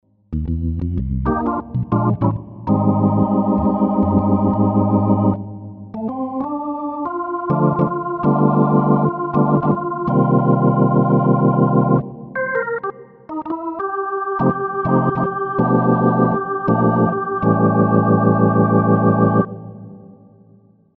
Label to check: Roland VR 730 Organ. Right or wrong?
Right